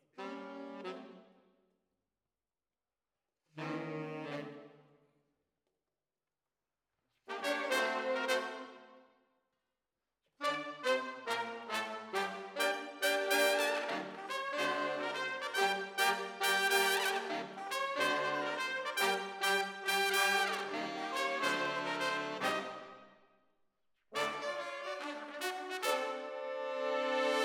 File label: ORTF, Jazz for piano